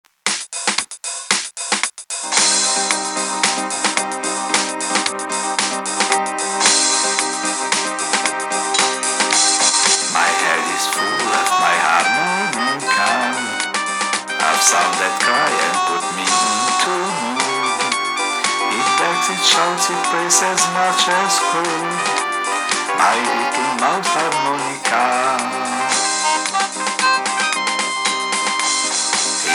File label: Funny harmonica